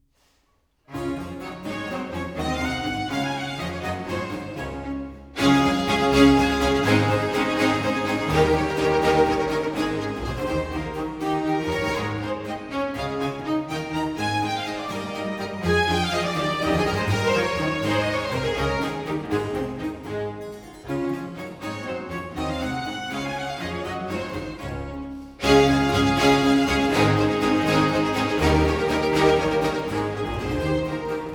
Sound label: Overture in D Major for strings and basso continuo; Concerto, Venice The Golden Age; La Stravaganza; Op. 4 III. Presto